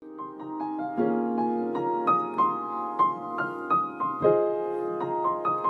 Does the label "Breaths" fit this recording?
No